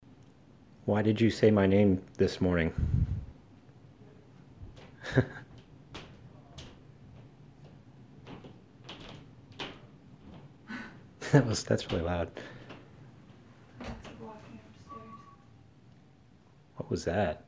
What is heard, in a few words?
whistler